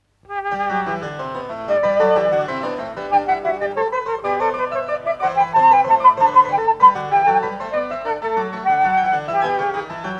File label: Creamsonata H minor - III. Adagio